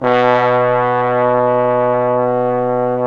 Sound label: BRS FF BON, Bone Sec.1